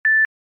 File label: note 8 beep once notification